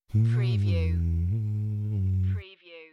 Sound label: Male humming; beanstalk audio